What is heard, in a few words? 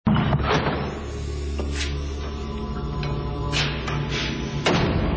open close